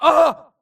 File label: grunt death quick; Titanfall 2